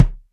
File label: BEAT KITS, K; Crypton - DrumBase5000